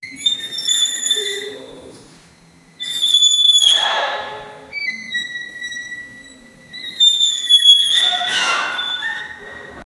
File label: sex sounds in a dungeon